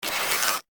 pneumatic blast

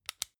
flashlight on fast

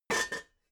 pots stack soft

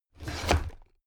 drawer cabinet crammed open heavy halfway

